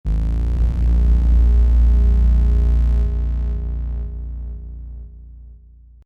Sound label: bass